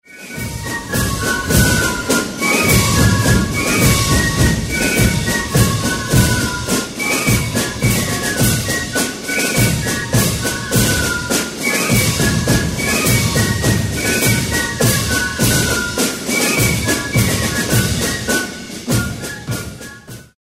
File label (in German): Polka